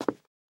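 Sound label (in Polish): kroki pojedynczy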